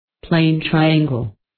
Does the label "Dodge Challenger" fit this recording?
No